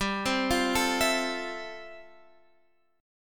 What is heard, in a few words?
F Major 9th, chord; Fmaj9/G arpeggiated